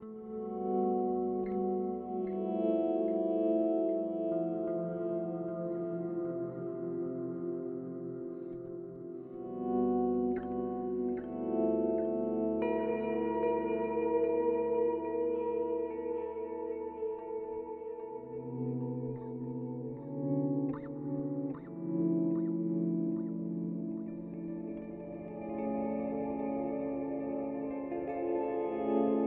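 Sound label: Avalanche Run V3 Stereo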